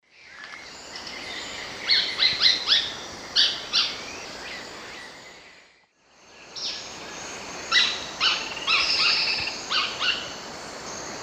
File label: Automolus infuscatus, calls pair; S. America; Eastern Lowlands